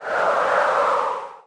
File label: Wind; New The Denpa Men